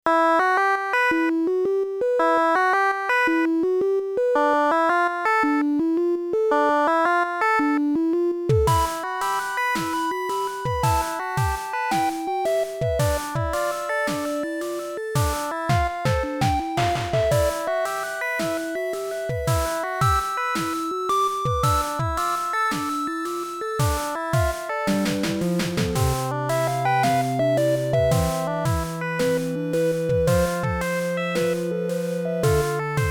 Cemetery (Internal Percussion); studio pixel

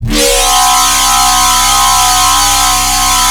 Humble, Noise; Cymatics; Shapeshift

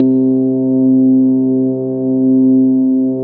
Electromechanical, Noise Osc; Cymatics; Shapeshift

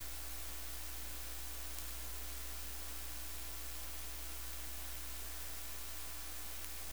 Middle Room Chandelier Stick (Candlest; Electronics Of My Great Grandma's House

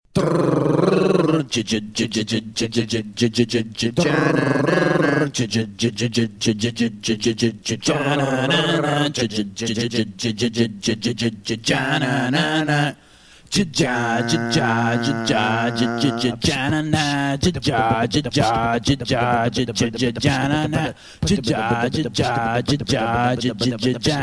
double bass song; lolicore; Lost Frog Productions

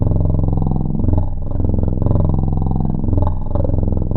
World Digidoo; Instruments; Synthetic's Serum Collection; Echo Sound Works Core Tables

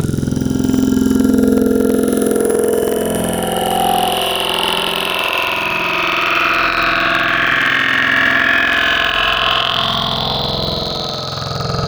Spectral - Burpie; Wavetables; Synthetic's Serum Collection; ESW Core Wavetables; Echo Sound Works Core Tables

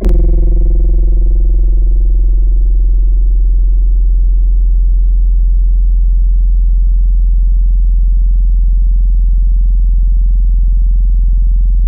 Bell Thin Metal; Instruments; Synthetic's Serum Collection; Echo Sound Works Core Tables

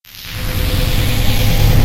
Eraser Laser

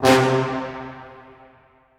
Noises Horn; Synthetic's Serum Collection; Production Master - Vital Future Bass 4

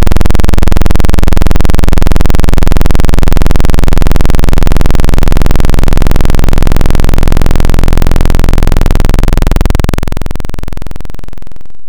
Square Pushy, Wavetables; Synthetic's Serum Collection; ESW Core Wavetables; Echo Sound Works Core Tables

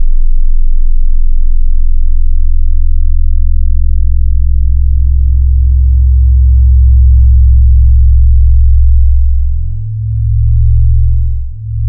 Chill Just Sines; Synthetic's Serum Collection; Echo Sound Works Core Tables

